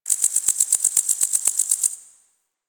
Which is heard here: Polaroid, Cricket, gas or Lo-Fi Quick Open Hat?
Cricket